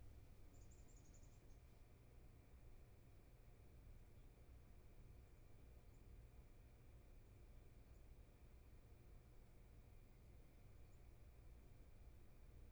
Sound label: roomtone